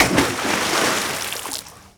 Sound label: Splash Hard